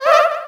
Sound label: Balloon fills; Enemy; DS; NEWSMB